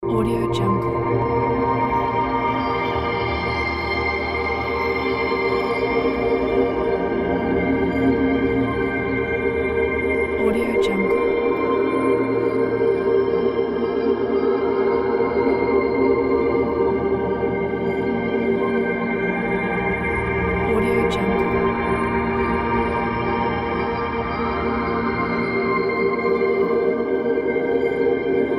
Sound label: cinematic background dream